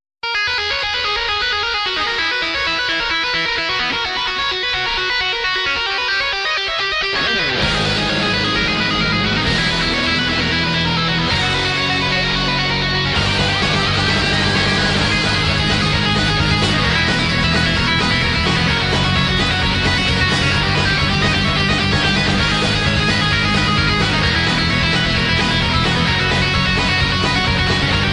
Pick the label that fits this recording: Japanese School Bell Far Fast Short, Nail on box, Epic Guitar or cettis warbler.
Epic Guitar